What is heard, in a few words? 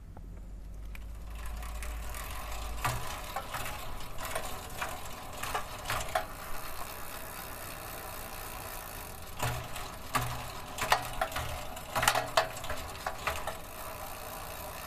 bicycle